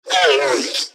Repair Drone Idle Surprised; Necramech; Battle Barks

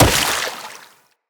Wall Jump Water, Footsteps; Wall Run; Characters; Tenno

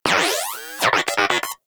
Moa Inquisitive Vocal Greeting; Pets; Warframe

